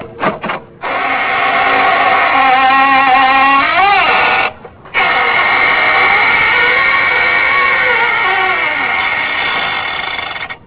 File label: creaky door